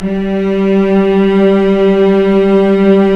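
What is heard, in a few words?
cellos, vibrato, mezzo forte; String Master Series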